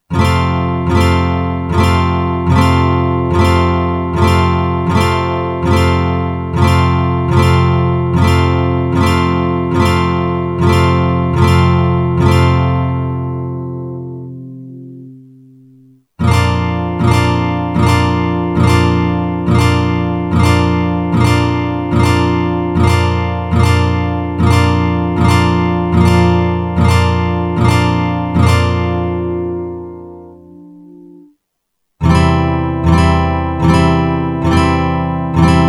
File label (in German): F Akkordfolge